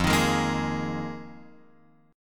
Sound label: F Minor 11th Guitar Open F; Fm11; strummed; JGuitar